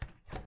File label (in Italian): Porta open; Phasmophobia